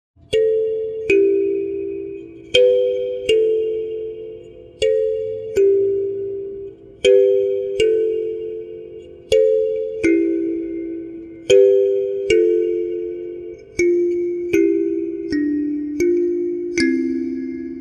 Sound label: Kalimba